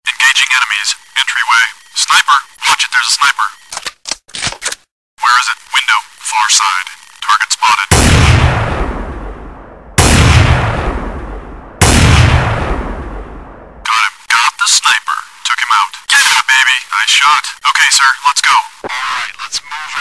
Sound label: Sniper Shot